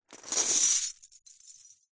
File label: foley keys large bunch prison guard pick up from carpet